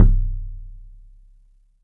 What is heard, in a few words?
THUD KICK; 3D Drums